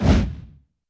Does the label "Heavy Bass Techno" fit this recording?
No